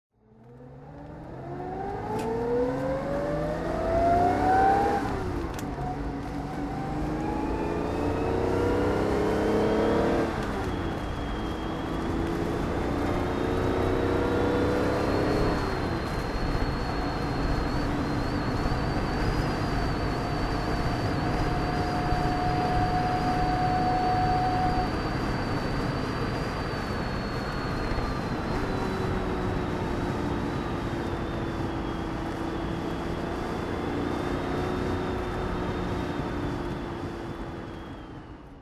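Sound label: jaguar etype v12 fhc manual drive, engine sound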